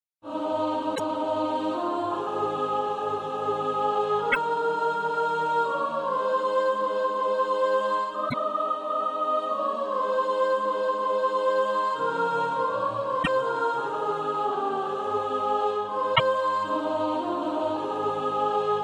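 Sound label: soprano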